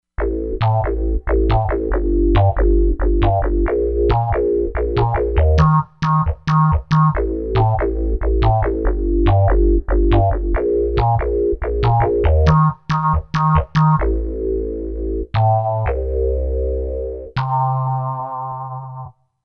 Res-Bass, Korg MSC-07S Synth

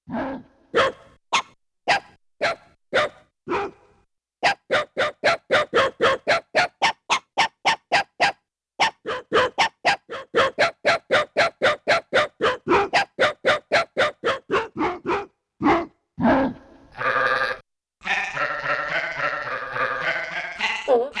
Animal Singer, Animal FX; Yamaha TX16W

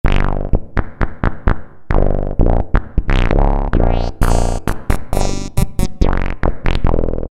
Bass, Alesis Micron; Alien Womb; Factory Programs

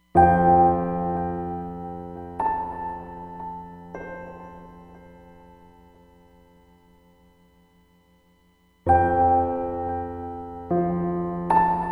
Ambient Piano, Roland Fantom XR

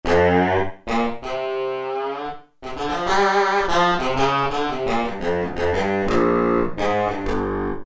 Growl Saxophone; Roland PN-D50-03

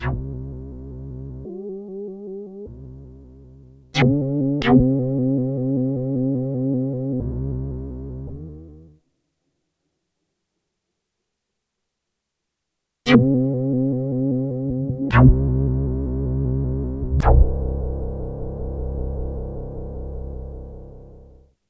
Korg DW-8000; Factory Patches

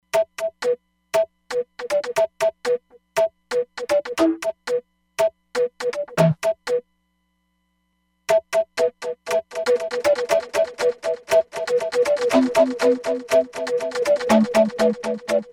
Chorus & 120 BPM Pan Echo, Roland DEP-5; Factory Patches

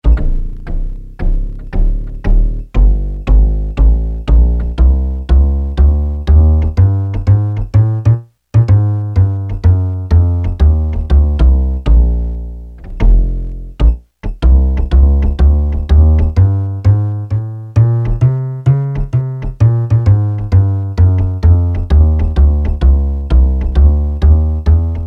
WOOD BASS, Yamaha PSR-300; Voices

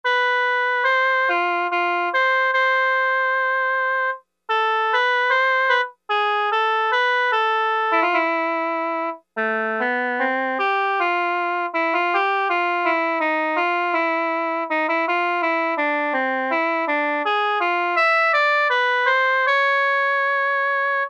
Oboe, Roland Juno-60; Factory presets

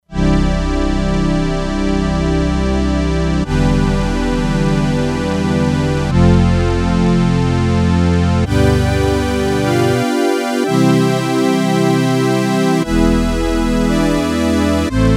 Analog Pad, E-mu Proteus-2; Factory Presets

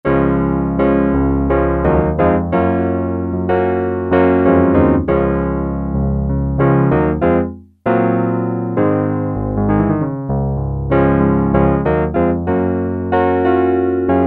E.GRAND, Yamaha DX7

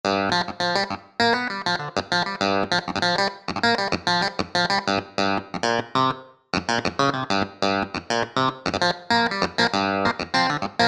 Clav; Keys; Roland SRX-07 Ultimate Keys

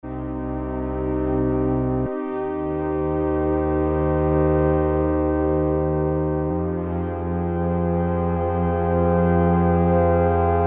FOUR POLE STRINGS, Oberheim OB-8; Factory Patches

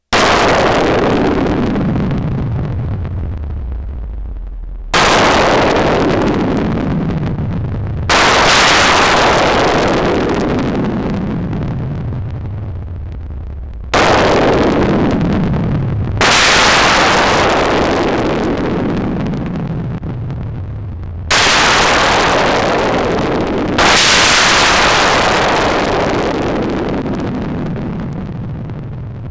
THUNDER, Roland SH-101; Factory Patches

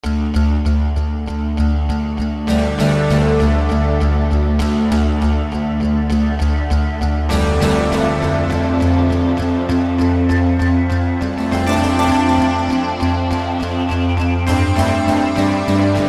Sybaby, Yamaha TG77; Factory Presets

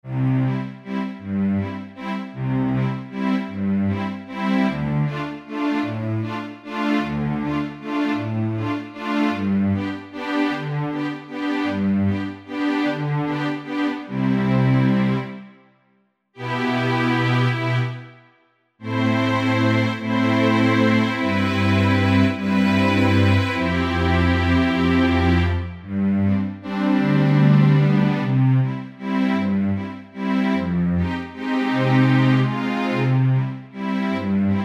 STRINGS Orchestral Strings; Apple GarageBand 2

